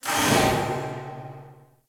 Galleon Electric Amb; Ambience; Warframe; Grineer Galleon

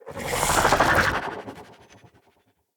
Staff Proj By; Weapons; Enemy; Staves; Mnd Wazard Staff

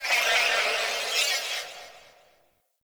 Corpus Ship Data Rand, Ambience; Warframe; Corpus Ship Remaster

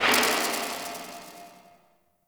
Rank Up; Focus

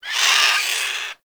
Avichaea Vocal Death; Hunting Critters

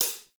Metronome Sweet Spot Tick; Warframe; Temple

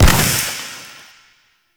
Arcane Melee Air Proj Death; Warframe; Mods; Proc

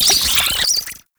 Oraxia Brood Spider Vocal; Warframe

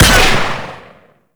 Sentient Pistol Shot Primary; Warframe; Amalg

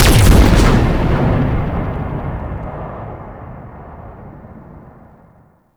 Corpus Arachnoid Camper Quick Draw Explosion; Warframe

